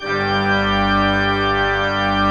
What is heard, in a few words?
MANUAL G1, Pipe Organ; E-MU Producer Series Vol. 2 – More Studio Essentials; Keyboards